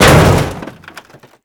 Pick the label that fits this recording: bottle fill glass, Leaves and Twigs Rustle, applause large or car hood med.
car hood med